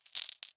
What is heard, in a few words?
Coins Poured Out; Miscellaneous; 1001 Sound Effects